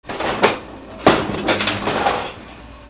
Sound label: INDUSTRY